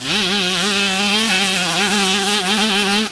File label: Power, Engine